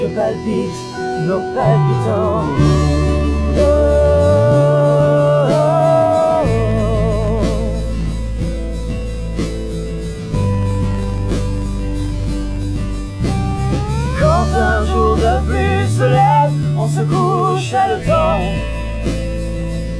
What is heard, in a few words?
harmonic